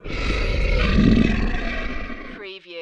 Monster Growl Breath

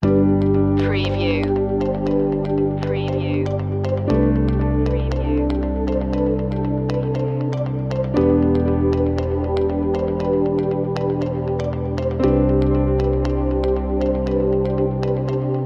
corporate

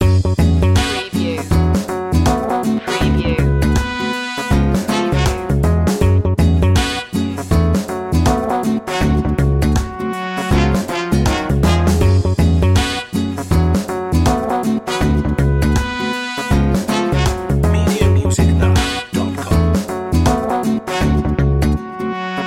spanish mood loop

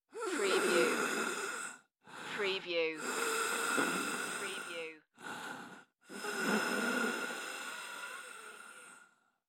Dying Breath Male; Sound Effects Bible

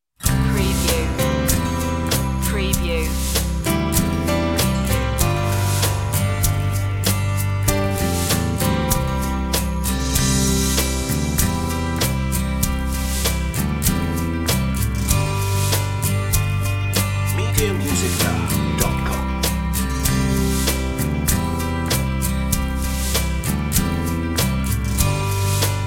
underscore version no sound-fx

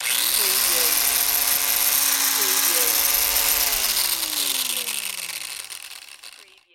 Rotosaw On Run Off; sound effects bible

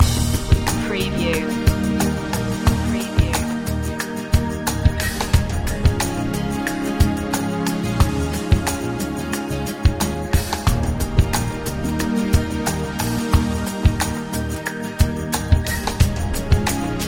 joyful summer loop

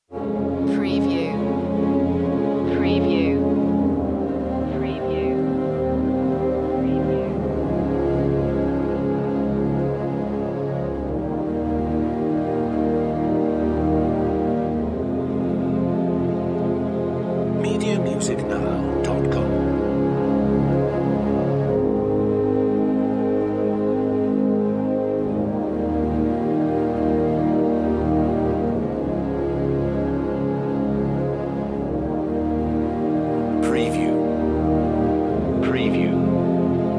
a touch strings only

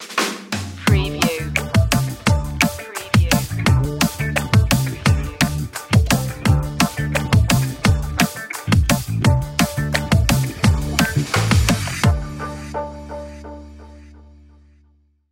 urban funk

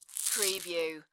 Marbles Or Pebbles Rattle

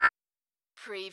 modern rollover

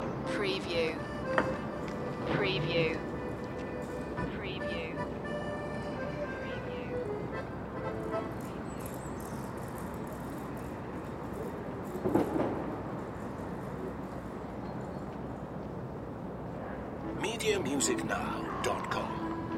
City Square Accordion Early Spring Birds

